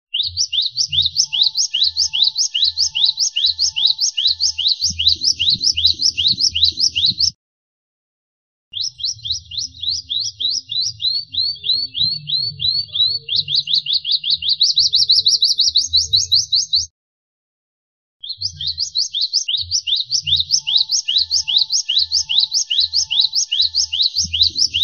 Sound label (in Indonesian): gelatik wingko master kenari